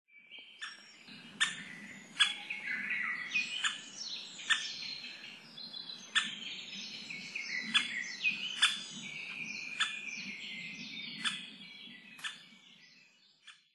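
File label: white-backed woodpecker, kosado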